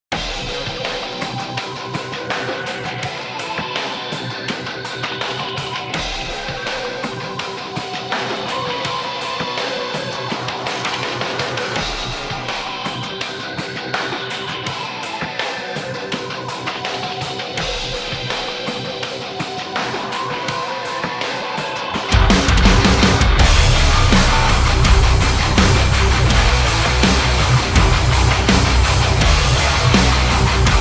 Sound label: Music kit Start Round; CS-GO